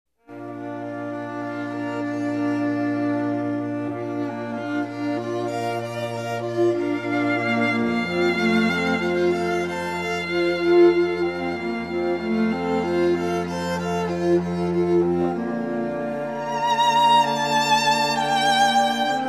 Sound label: instrumental, string quartet; Lords Prayer